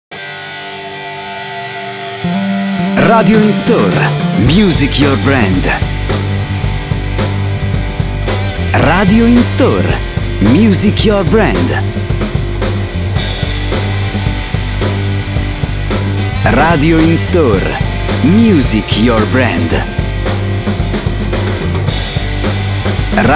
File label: Rock